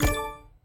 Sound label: tile cascade match; sweet shuffle